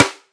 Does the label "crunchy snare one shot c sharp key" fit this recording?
Yes